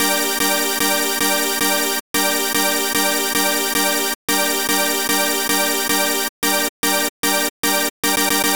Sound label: G 112, Discostep Synth Loops; No Exit